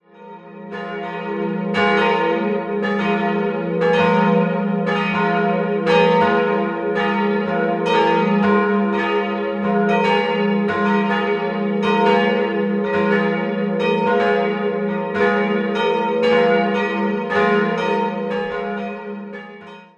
Weidenwang, Pfarrkirche St. Willibald, glocken; TeDeum-Geläute: f'-as'-b'; Die drei Glocken wurden im Jahr 1950 von Karl Czudnochowksy in Erding gegossen.

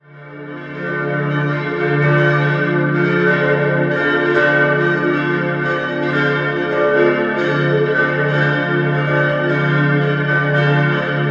Bubenreuth, Glocken

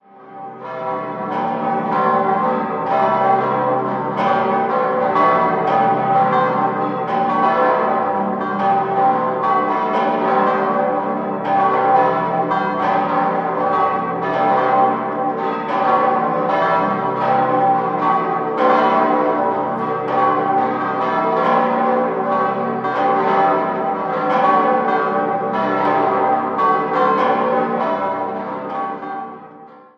Freising Dom alt, Glocken; Domkirche St. Maria und St. Korbinian

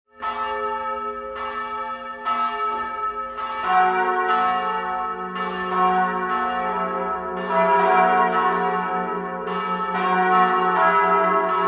Berngau, Glocken, Pfarrkirche; Pfarrkirche St. Peter und Paul; dem Jahr 1973 und wiegen 2.205 bzw. 1.130 kg. e' und a' dürften vom Klangbild her aus der Barockzeit stammen.

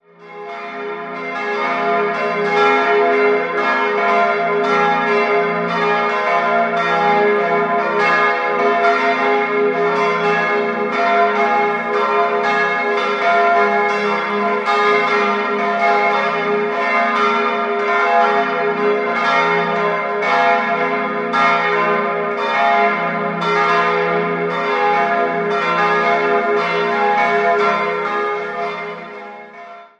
Neumarkt Heilig Kreuz, Glocken

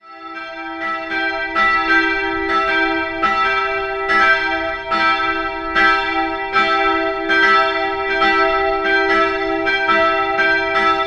Langquaid ev, Glocken

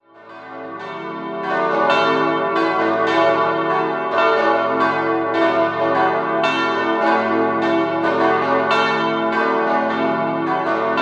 Hanau, Glocken

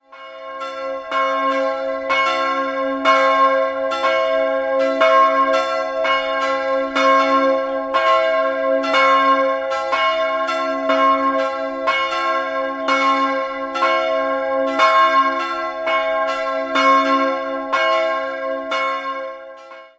Kerkhofen, Glocken

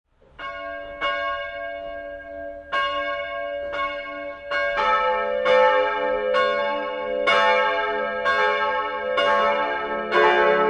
Irlahuell, Glocken

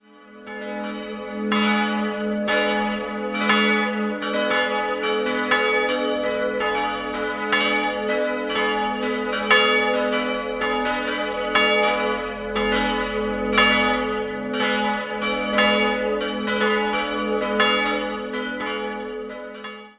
Gebelkofen, Glocken

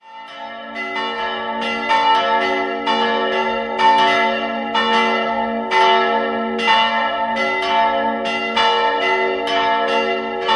glocken; Saalhaupt

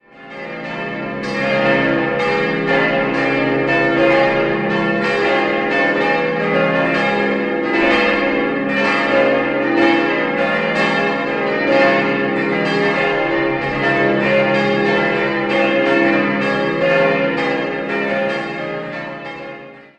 Triftern, Pfarrkirche St. Stephan, glocken; in Passau gegossen; 1950; 1973; Rudolf Perner

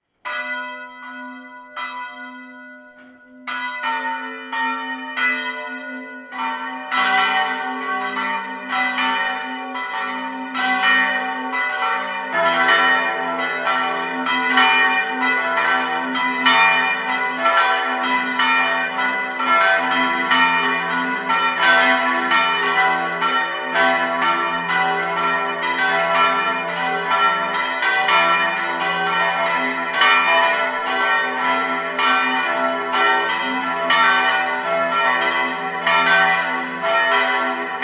Waltersberg, Glocken

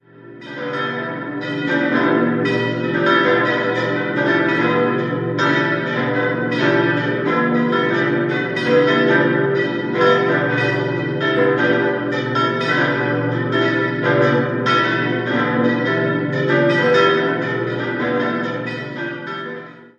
Biberbach Schwaben, Glocken

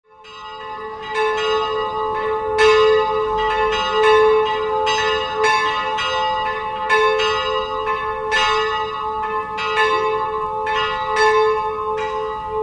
Dietfurt Frauen, Glocken

